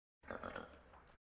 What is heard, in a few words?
Animal effects snorts grunts farm ambience